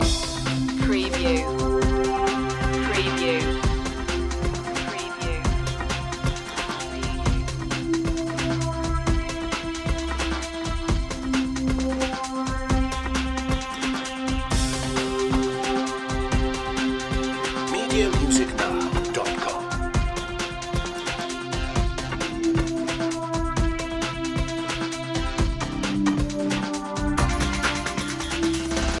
funk head loop

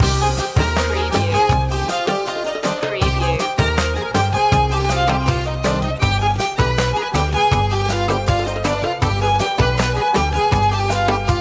gypsy wedding loop

